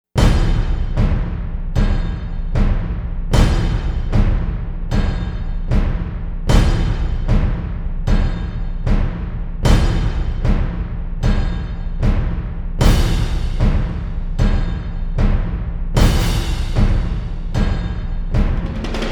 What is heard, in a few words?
drums